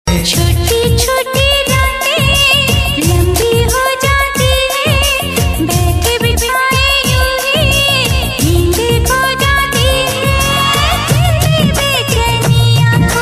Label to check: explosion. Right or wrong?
Wrong